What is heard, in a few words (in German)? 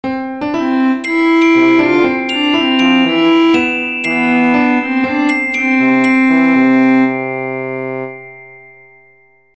melodie